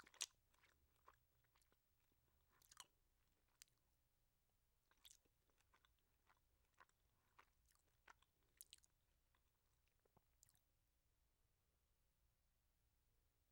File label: Sticky Eating Chewing; Fairlight Sound Lib